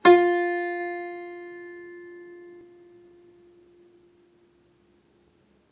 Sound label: string 1 fret 1